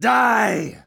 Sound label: Curse Vocal; NPC; Dominus Combat